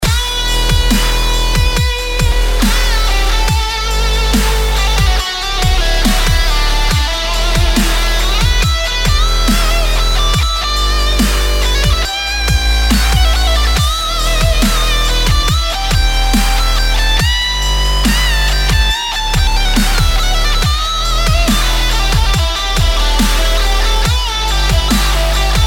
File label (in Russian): elekro gitara dabstep